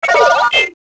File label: droid vox